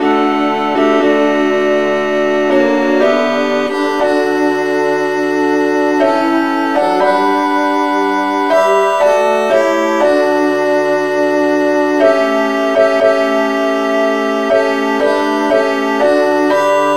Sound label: jspm satb key g